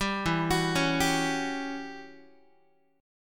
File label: E Minor 6th Add 9th guitar chord; Em6add9 arpeggiated; JGuitar